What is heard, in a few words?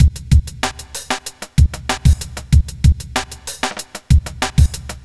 kit